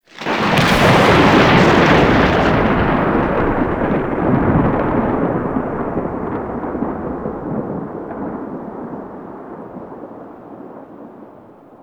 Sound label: Shipyards Storm Thunder; Exterior; Warframe; Grineer Shipyards